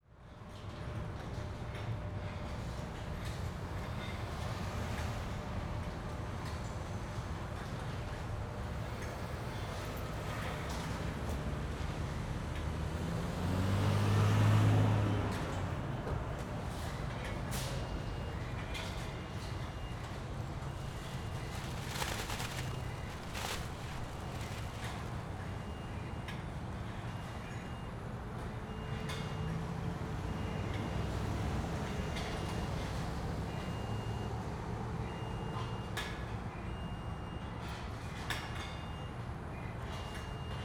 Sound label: Ambience Grocery Store Entrance Exterior; AdobeCC